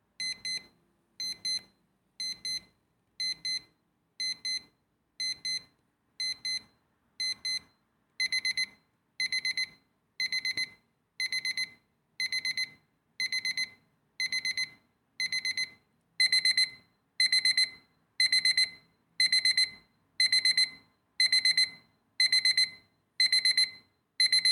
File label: sample of the amplified sound of the alarm